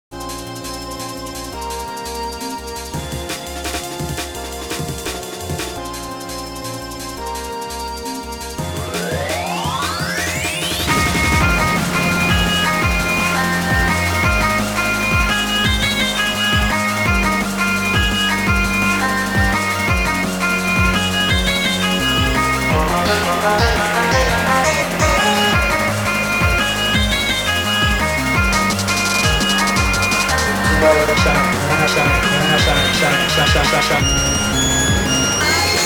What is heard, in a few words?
Drum n Bass Remix; 炎の映像; ニコニコ動画